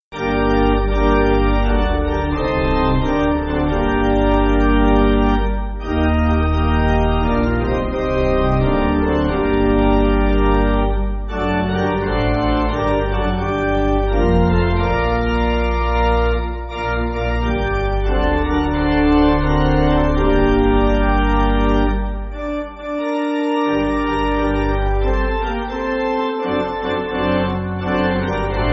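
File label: Pipe Organ, Music Backing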